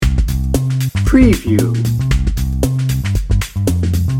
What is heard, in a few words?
happy hip hop loop